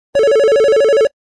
Telephone Ring; GW300 Onliner

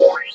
brighter; Samsung SCH-i539

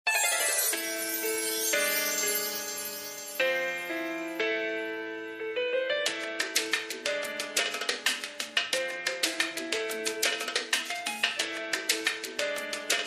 Arabic Lounge; LG VX5600 Accolade

